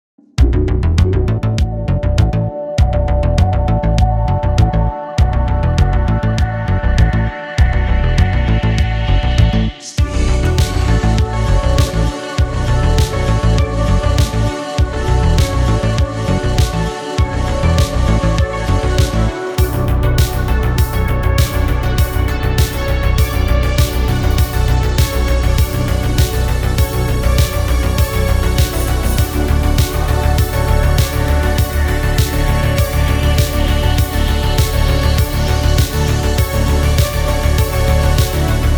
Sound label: speed demon remix synthwave